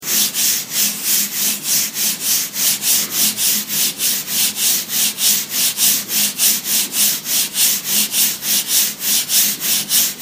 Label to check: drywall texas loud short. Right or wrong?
Right